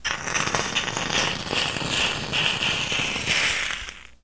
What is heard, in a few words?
Steam Sizzle Hit